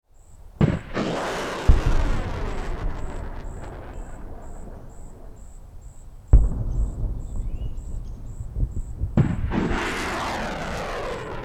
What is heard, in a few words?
Nighttime rocket launch and explosion